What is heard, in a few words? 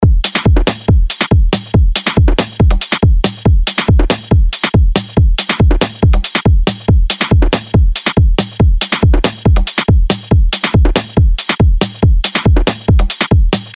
140 Drums